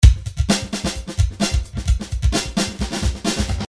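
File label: drums 130 pattern; Paul Kodish Dangerous Drums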